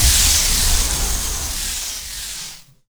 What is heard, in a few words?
freeze fire sound, freezegun; weapons; Quake 4 Alpha